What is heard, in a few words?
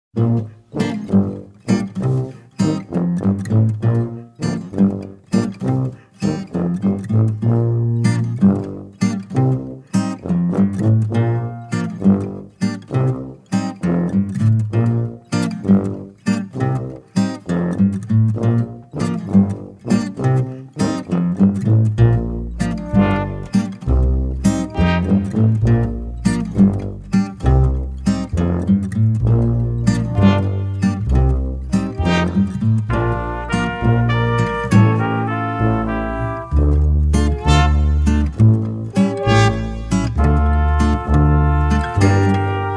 instrumental